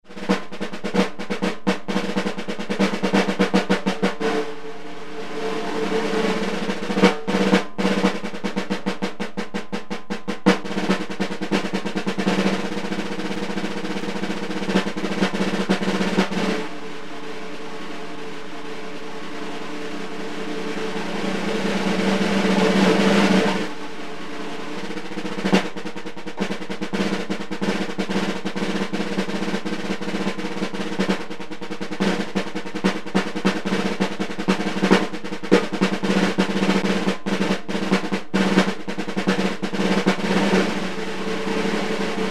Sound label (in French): roulement